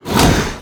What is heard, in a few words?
Antler Attack